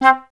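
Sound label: flutes staccato C3; Best Service; Peter Siedlaczek's Advanced Orchestra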